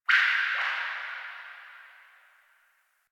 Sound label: Drip Interior Small, Ambience